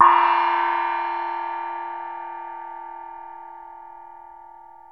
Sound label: TIBETAN, Asian Cymbals